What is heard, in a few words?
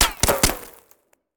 Bullet Ricochet; Sound Ideas; Guns